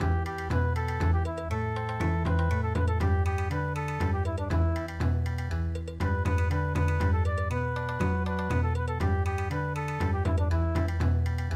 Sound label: Bon Odori